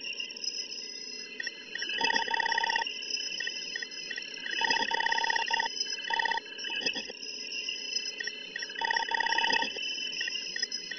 computer loop

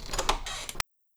doors

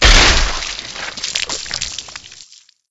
body splat

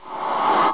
lava

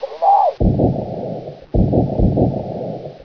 disaster; ambience

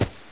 foot; hl2

